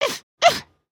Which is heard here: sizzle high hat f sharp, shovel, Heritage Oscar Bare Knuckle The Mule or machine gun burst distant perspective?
shovel